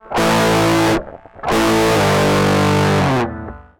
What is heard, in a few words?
blue jay guitar